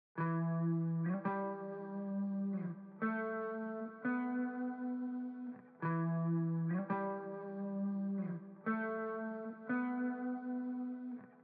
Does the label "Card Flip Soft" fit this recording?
No